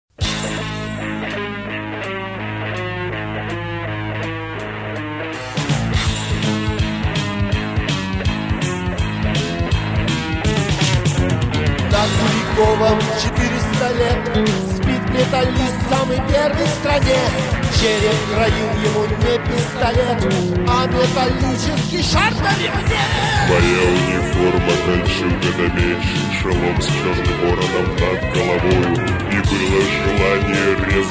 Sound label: russian metal